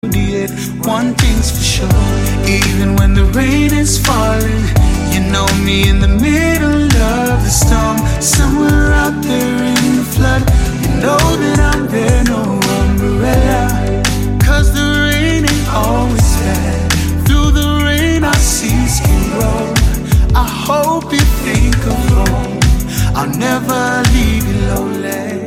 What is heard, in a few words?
Spaceship 808